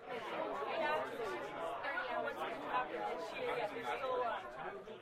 croud; Original TK Sounds

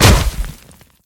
sand hard land; Original TK Sounds

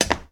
glass step; Golden Crayon Sounds

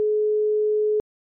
ringback tone; iOS Sounds

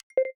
Mic Mute; iOS 26 Sounds

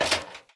ladder step; Golden Crayon Sounds

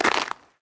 rubble step; Golden Crayon Sounds

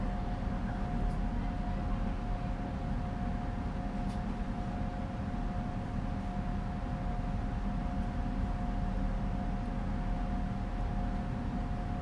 train station; Golden Crayon Sounds

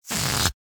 balloon; Golden Crayon Sounds

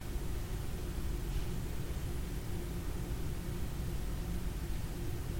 room tone; Golden Crayon Sounds

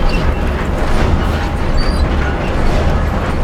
machinery; Golden Crayon Sounds

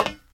metal step; Original TK Sounds

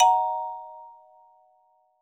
Rotor Right; quinton's new sound scheme

